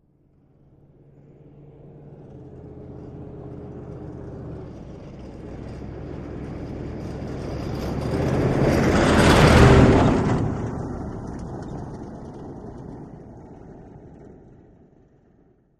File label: Humvee: Medium By.